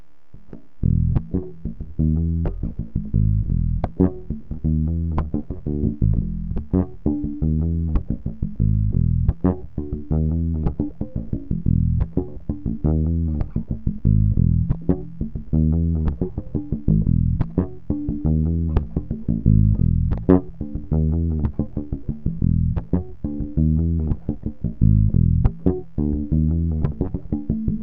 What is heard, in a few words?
Bass idea